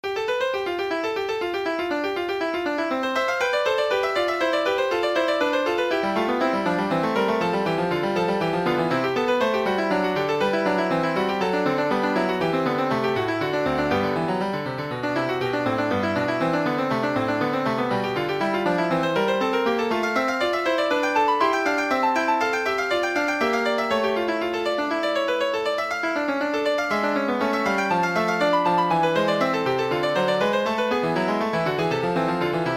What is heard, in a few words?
fugue c major, piano